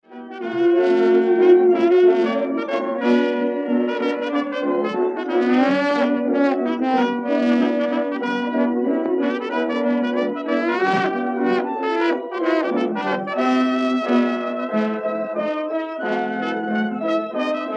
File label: Trombone Laugh, Jazz Orchestra, Foxtrots